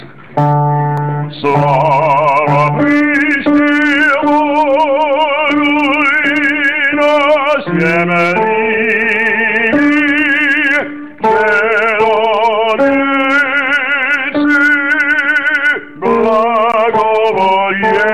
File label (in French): basse; slava v vychnih bogou